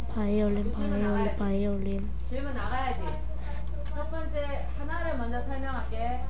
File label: violin